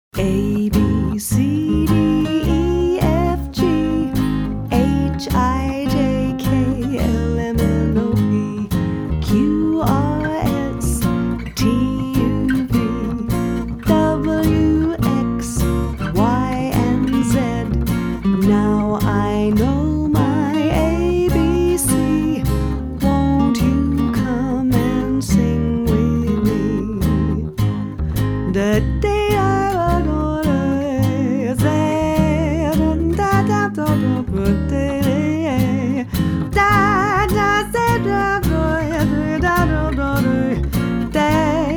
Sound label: the alphabet song